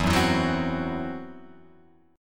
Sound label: E Suspended 2nd Sharp 5th E, Guitar, Open F, Esus2#5 chord; strummed; 4,1,4,1,3,1; JGuitar